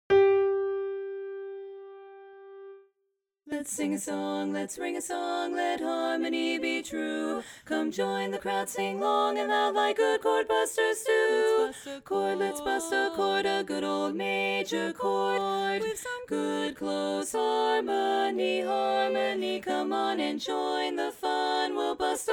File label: bari dominant, chorus